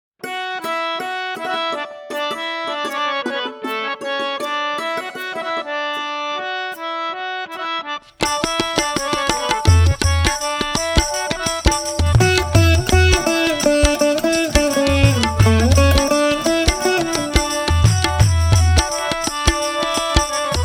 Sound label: Ladies Karaoke D Pancham; Jai Durga Lakshmi Saraswati